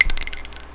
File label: shell, player